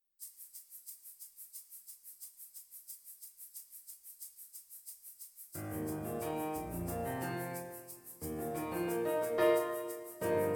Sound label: Soprano 1